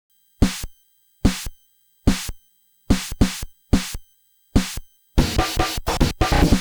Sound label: NES Drum Loop